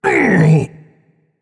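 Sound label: non-verbal ouch; smite; atlas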